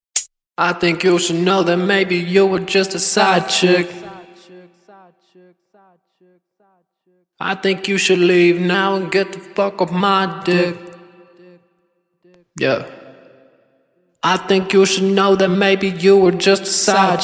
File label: Acapellas, trap 140 Gm